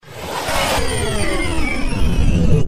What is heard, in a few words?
3G Power Off; Pantech; P2030 Breeze 3